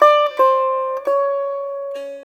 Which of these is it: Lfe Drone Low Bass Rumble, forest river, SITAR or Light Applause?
SITAR